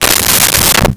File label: Dresser Drawer Closed; Doors and Drawers